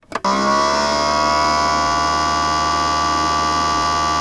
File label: gear; cannon; qml-box2d